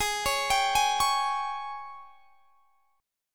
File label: G sharp Major 7th Suspended 4th G sharp; G#M7sus4 Chord